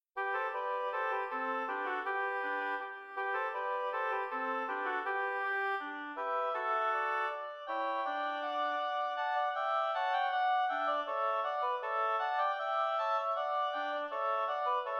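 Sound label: marche oboe trio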